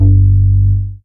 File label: MOOG BASS G2; Best Service Dream Experience